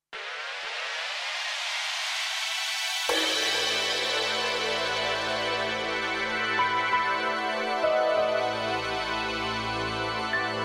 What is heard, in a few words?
Birds Fly; mixtures